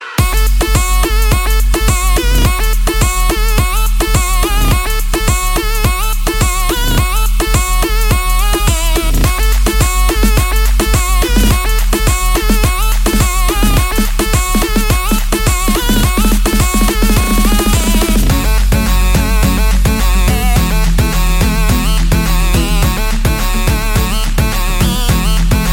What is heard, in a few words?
Trance